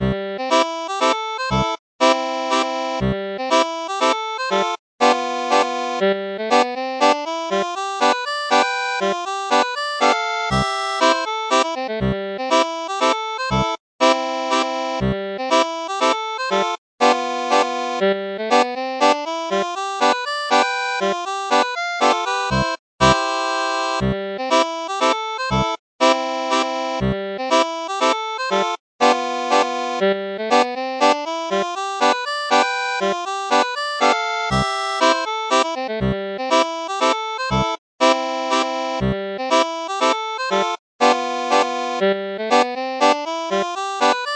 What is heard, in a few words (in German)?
Mazurka, steirische Harmonika